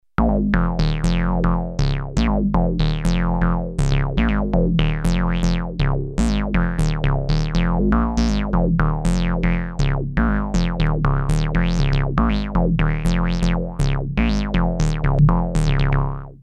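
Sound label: bass, Compact analog monophonic synthesizer; MFB nanozwerg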